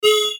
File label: Beep Airy Reverb; Production Element Imaging Element Accent Transition; Multimedia; Beeps